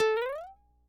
Small Mario Jump High, Sound effect

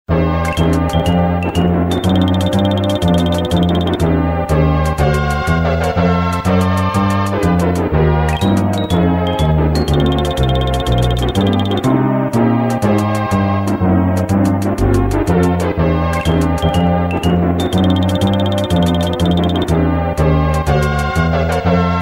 Tournament Lose; SMK

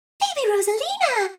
Unlocked; MK8; Baby Rosalina

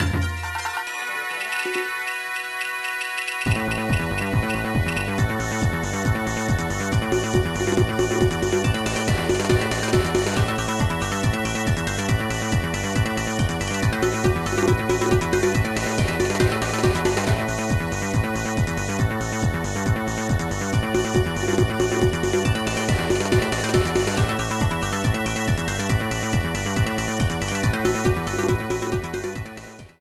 Pillow Fight Music

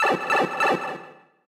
Pipe Travel; SM3DL

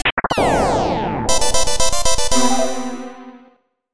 Tetris Game Over

